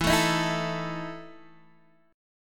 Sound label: E Major 7th Suspended 4th E down Guitar Open D